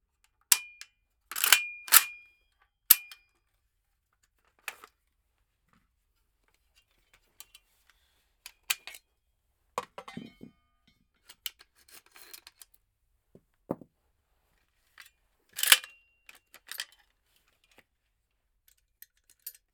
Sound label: AK-74 assemble disassemble; FLYSOUND